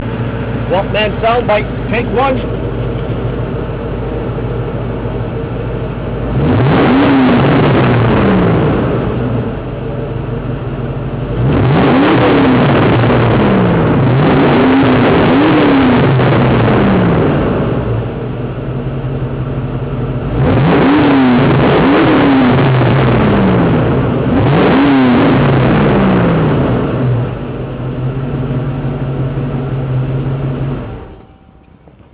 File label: muffler sound bite